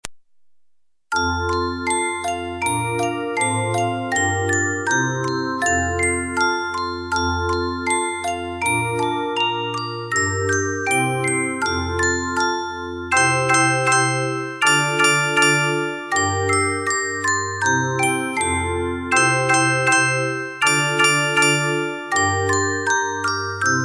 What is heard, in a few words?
handbell